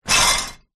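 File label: Dishwasher Slam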